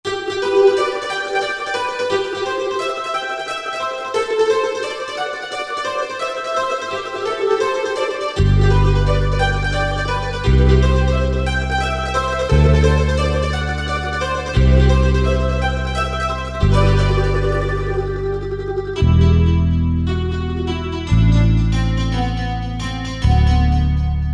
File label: Le G String, Country; Roland SR-JV80-17 Country Collection